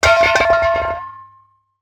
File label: heavy metal tube drop